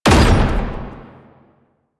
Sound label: Perfect Heavy Attack Impact; Warframe; Weapons; Melee